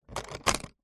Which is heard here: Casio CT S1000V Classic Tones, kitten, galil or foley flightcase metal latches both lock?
foley flightcase metal latches both lock